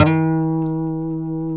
F 3 HAMRNYL, HAMMER NYLON, Guitars

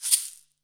shaker; Zero-G; Total Drum Bass

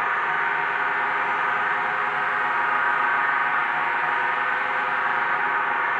Panned Organ, non tempo loops; stereo toolkit samples

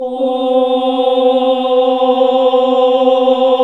OHH C, Nuns Ooohh; Sound & Vision - Gigapack II

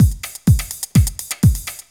Loop, Roland; Sound & Vision - Gigapack I

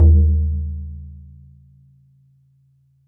BODHRAN, Celtic Percussion

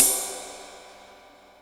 Flat Top, Cymbal, Yamaha DTXpress

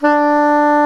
SAX SOP MP D, Soprano Sh; Roland LCDP07 Super Sax

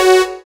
SYN DANCE; Sound & Vision - Gigapack II

